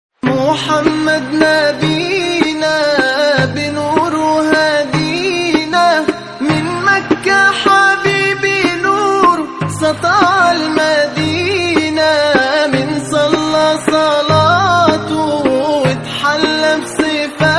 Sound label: nasheed arabic naat islamic